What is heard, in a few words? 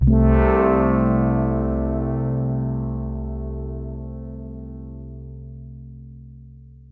synth brassey corn pad interval